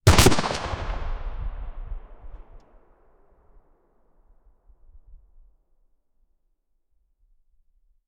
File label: cannon